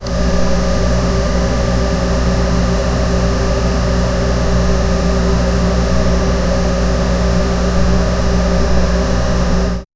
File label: computer intercooler over; overheat